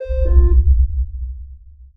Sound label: sonar Ping Air Close Beacon Directional; Barotrauma; Real Sonar